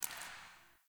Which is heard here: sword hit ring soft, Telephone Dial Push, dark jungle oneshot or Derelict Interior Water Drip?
Derelict Interior Water Drip